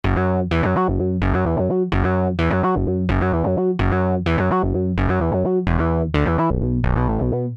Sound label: bass sh; roland mvs1